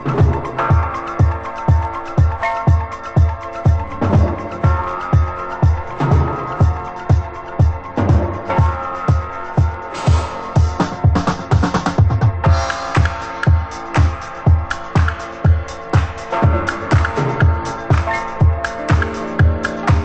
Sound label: funk